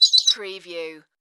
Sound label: Laugh